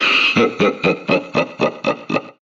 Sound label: Mewtwo cackle; SSB4